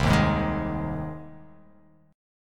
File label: C Minor Sharp 5th C down; strummed; JGuitar